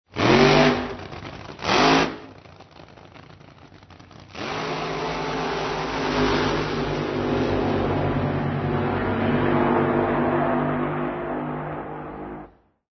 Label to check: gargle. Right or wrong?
Wrong